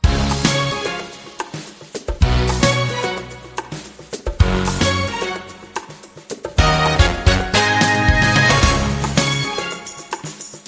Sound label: game loop